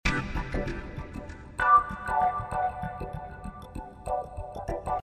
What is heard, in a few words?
Swinglefunk C